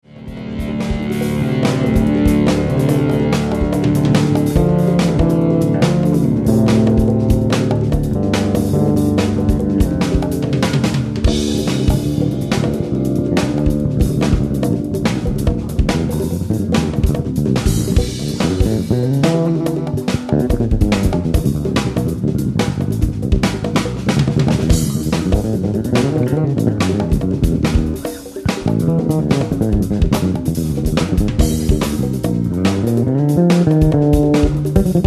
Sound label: solo; Sean Malone; Jazz & Other